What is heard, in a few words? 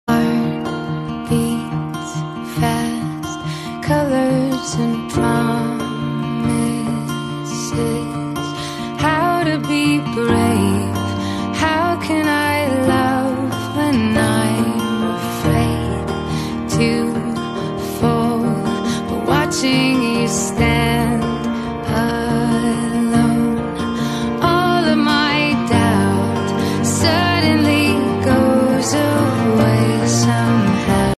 bg music; Engagement Invitation